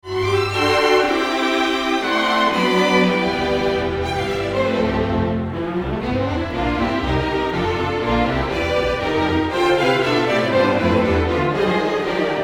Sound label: Celebration Fanfare